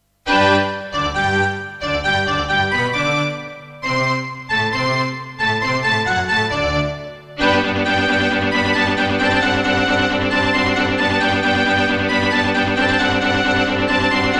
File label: Serdaco Dreamblaster S1; Mozart - Eine Kleine Nachtmusik partial; classical